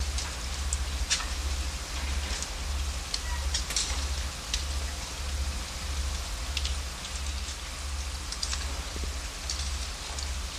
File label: Hydrosphere Ambien